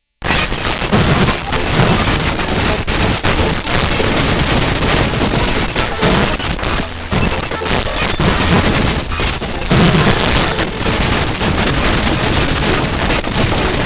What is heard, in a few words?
casio ct 380; circuitbending; Gijs Gieskes